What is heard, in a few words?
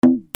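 percussion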